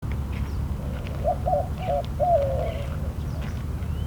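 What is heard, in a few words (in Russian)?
cooing sounds coming from the forest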